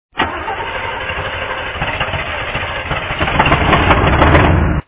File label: starter